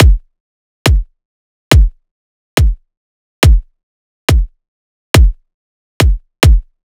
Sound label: Riddim Drum Loop Kick 140; Drum Loops; Ghosthack; Riddim Essentials 2020